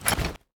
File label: Metal